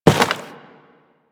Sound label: Worker Step Default; Solaris; SolarisWorker; Steps; Warframe